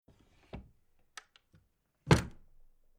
Household Old Wooden Writing Cabinet Top Desk Folding Closed; Drawer; Tom Hutchings